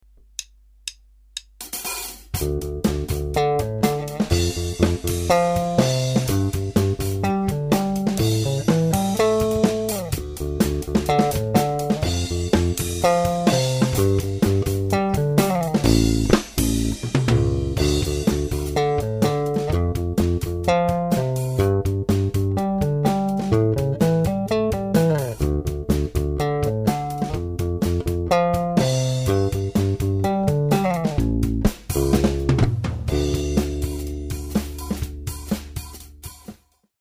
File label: 1978 Ripper, gibson bass